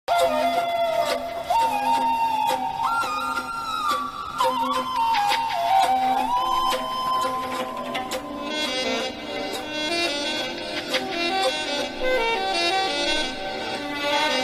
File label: romantic love bgm ringtone; sridevi soda centre; sudheer babu